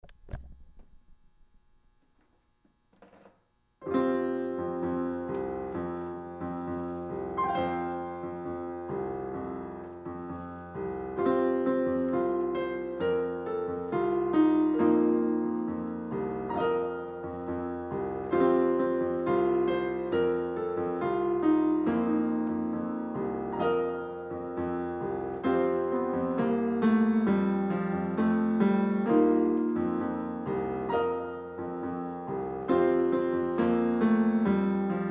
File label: celebration bells